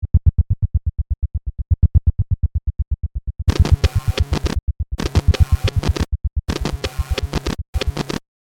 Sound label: Composition Beats, Production, Element, Composition, Beats, Timer, Countdown; SoundEffects-BlastWave